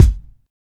short reverb kick drum one shot F sharp key

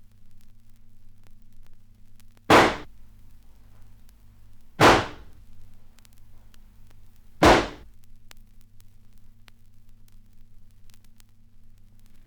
horror hits and vinyl crackle

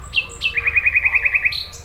blackbird repetitive sequence; soundscapes

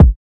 short reverb bass drum one shot a sharp key

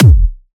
crispy kick one shot f key; kick drum samples

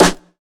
subby snare drum g sharp key; snare drum one shots

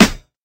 rich lows steel snare drum D# key

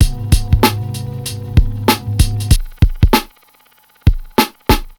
96 bpm drum beat G sharp key; drum loops

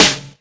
sizzly snare drum sample c sharp key; snare drum samples

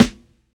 tight snare d sharp key; snare drum samples

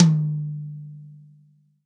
hi tom sample d key

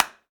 hand clap sample a sharp key; single hits one shots

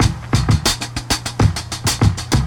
130 bpm breakbeat c sharp key

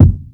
perfect kick drum single hit f key; kick drum samples

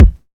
perfect kick drum one shot c key

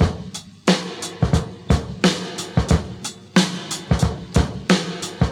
90 bpm oldies drum beat f key; Samplerate 44100 Hz

